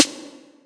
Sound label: Small Reverb Steel Snare Drum D Key; snare drum samples